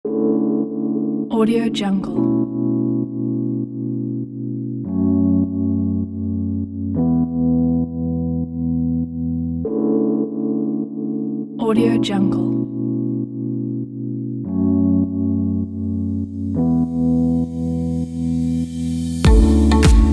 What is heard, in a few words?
fashion summer chill lounge kit